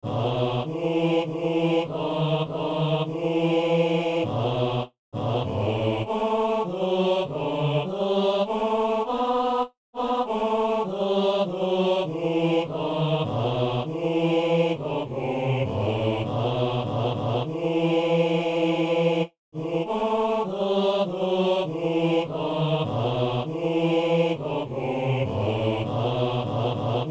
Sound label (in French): basse; Au coeur de nos détresses